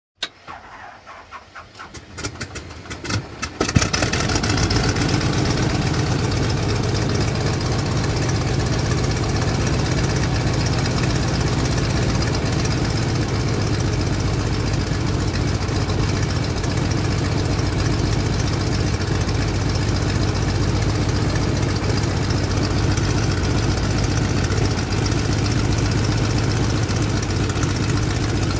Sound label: Light Aircraft Engine Start